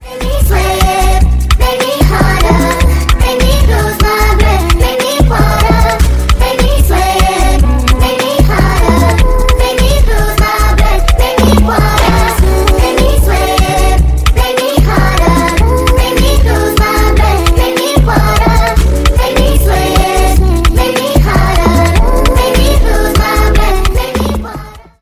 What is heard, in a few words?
water ringtone